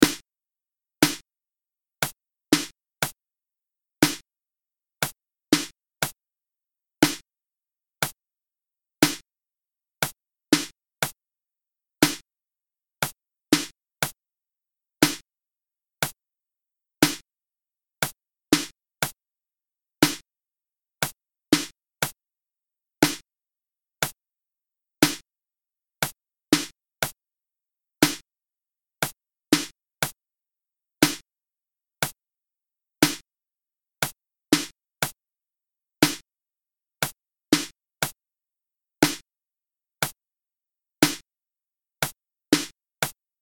hokum shuf, fiddle

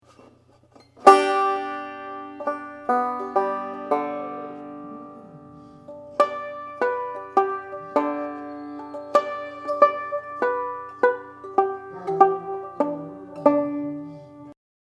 harmonics, banjo

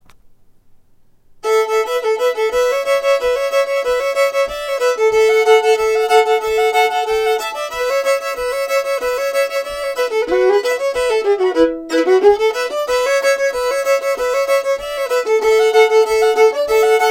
cajun fidd

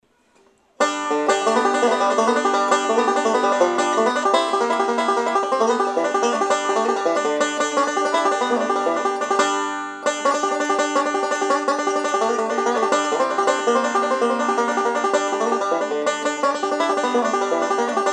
banjo

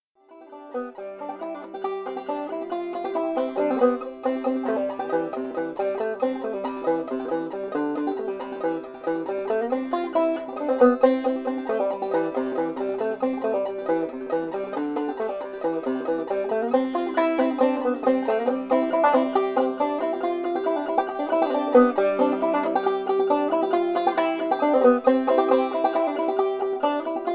tuba, banjo

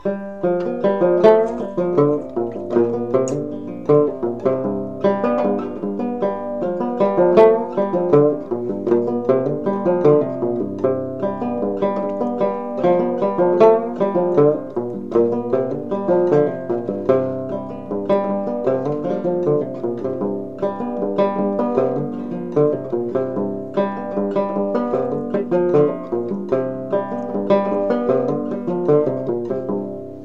gut string, banjo

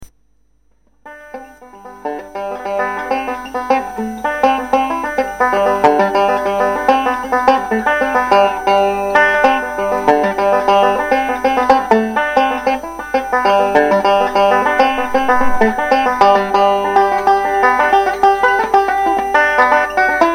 irish wash, banjo

